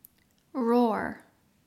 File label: roar